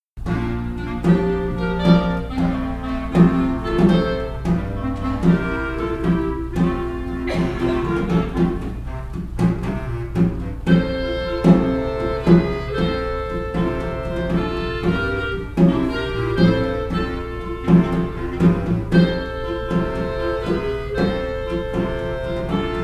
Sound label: yellow bird clarinets